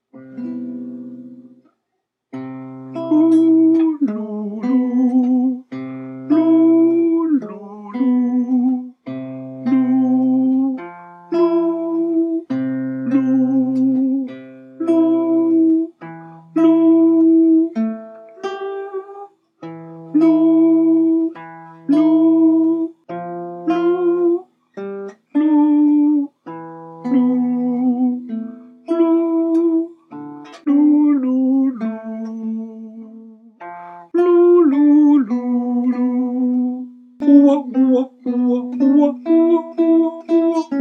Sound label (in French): la recette tenor, chorale